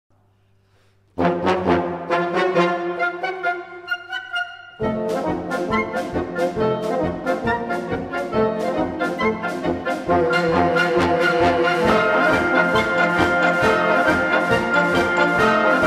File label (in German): Polka; Furioso